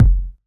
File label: Kick, Kickdrum; Equipped Music; Loungin House